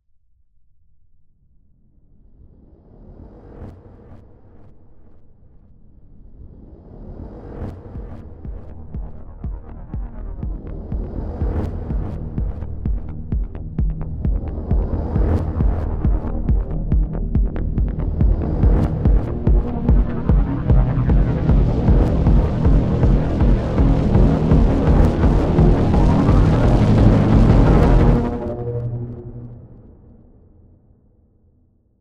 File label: Unsettling horror soundscape